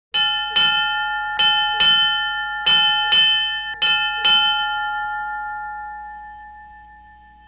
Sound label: bell